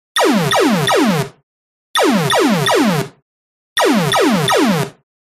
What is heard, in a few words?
Gun Stun Electronic, lasers